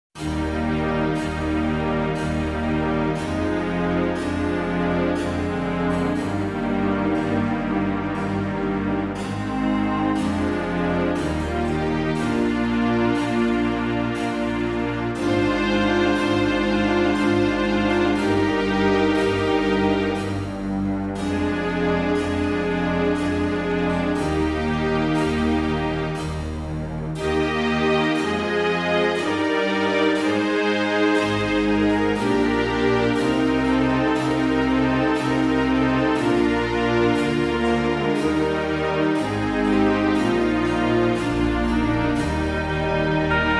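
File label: strings E-flat major medium; ombra mai fu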